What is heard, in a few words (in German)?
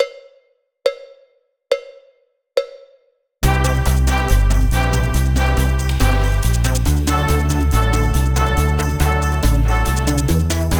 Akzente 3er Verschiebung; warm-ups